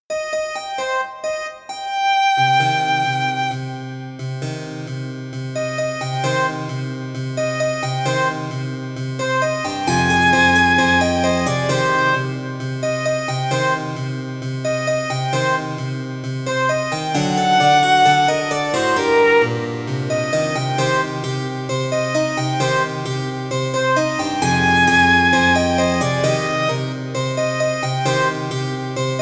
viola harpsichord

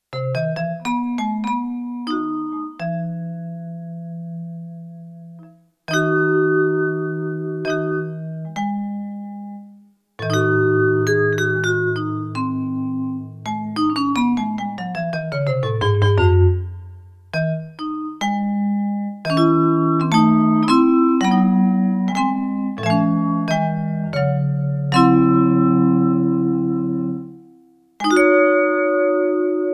vibes; JI; 7 9 11 13

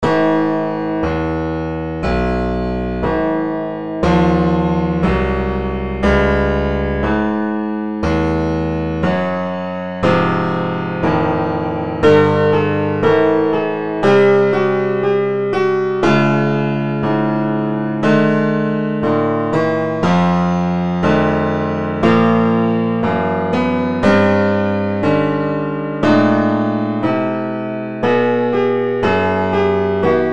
mini piano 22 study in 22, 22-ET

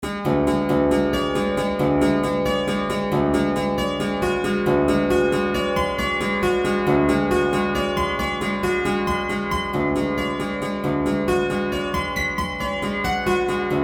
lute no octaves; bell tuning compare

